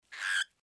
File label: cockatiel